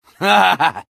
laugh evil; medic; source engine; tf

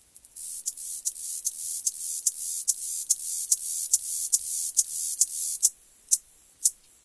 crik, forest; ambient; source engine; ep2

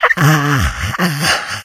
death, infected

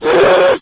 rage at victim, infected female; Left 4 Dead 2; Source Engine

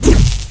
batsaber swing; tf

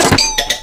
point scored; Left 4 Dead 2; Source Engine

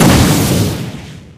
vort explode; npc; source engine; ep2

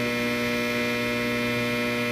light hum; Source Engine; tf; lair

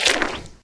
paint, footsteps

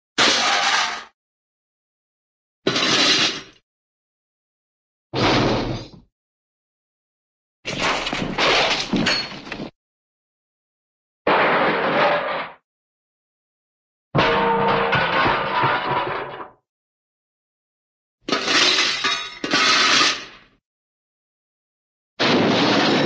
crash, metal metal pan drop; cartoon

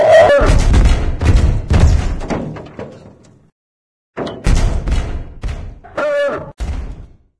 hunter sighting; video games; ambient; source engine; portal2; outland

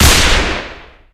spy revolver, weapons; enforcer; tf

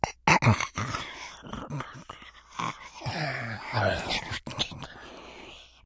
breathing, infected

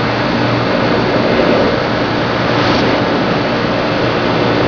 breather; ambience

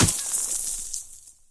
hit snow; weapons; source engine; dod

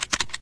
keyboard clicks; ambient; Source Engine; video game

